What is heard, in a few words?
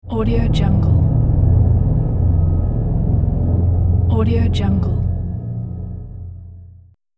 creepy horror atmospheric